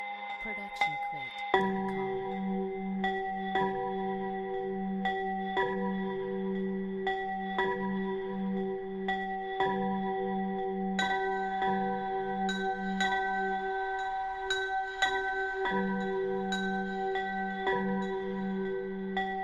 wedding bells; soundscrate

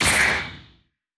anime hit

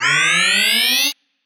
scifi weapon power up mid

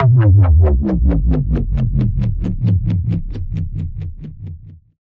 Bass Drop; SoundsCrate

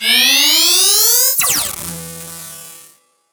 scifi weapon charge and blast

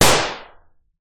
classic gun shot; soundscrate

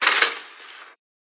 sharpener

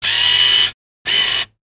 camera zoom

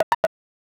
graphics glitchy motif; soundscrate

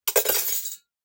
Glass Debris; SoundsCrate

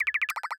Bleep Charge Up; SoundsCrate

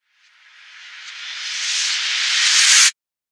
magical woosh reverse; soundscrate

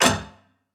robotic click; soundscrate

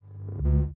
lightsword swing swipes; soundscrate

